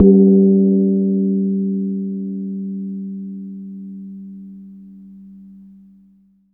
JAVA GONG G3, GAMELAN; Spectrasonics; Heart of Asia; GAMELAN SET

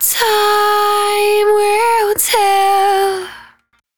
110bpm Ab, Velvet Vocal; Zero-G

